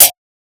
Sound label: Hats, Alesis Performance Pad